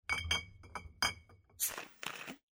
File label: party pouring drinks